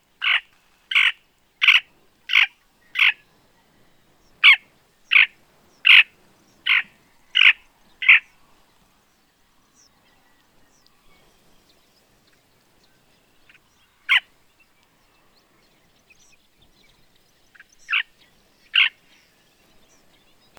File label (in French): grebe esclavon, chant